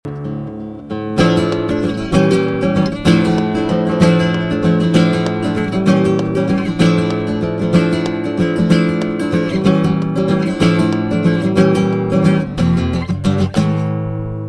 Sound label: flamenco guitar, latin